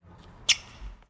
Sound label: Loud Sneaker Shoe Squeak Step Sound